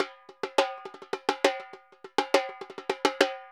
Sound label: Repique 136; Best Service; Festa Latina VOL-1; Drums & Percussion